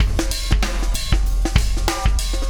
Wormhole Beat, Processed Drumkits

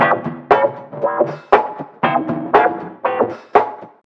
mystery funk; Sonic Foundry (Sony Creative Software); Ugly Remnants VOL-2

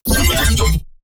insane glitch; bass

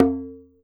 TUBANO; E-MU Producer Series Vol. 7 – Old World Instruments

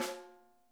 snare tap; Sonic Reality; Interactive Drum Kits; ID SNARE 2+

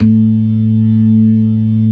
ORGAN, LOWER MANUAL; Keith Emerson Signature Series

